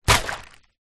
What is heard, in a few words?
foley small plastic bag filled with water place down on wood surface; zapsplat